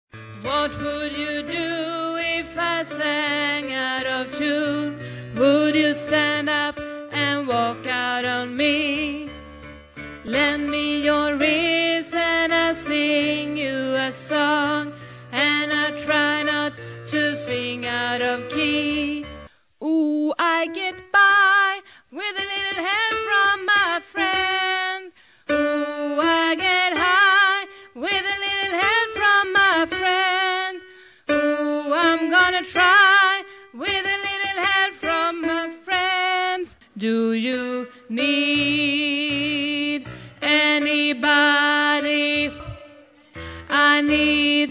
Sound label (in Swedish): alt, stammor